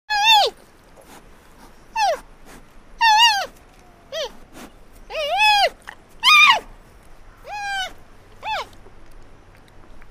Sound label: Panda Bleats Breaths